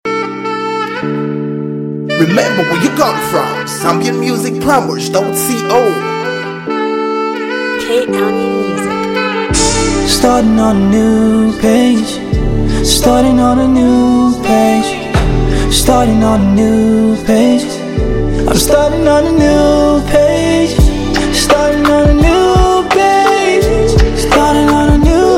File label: Beat Hook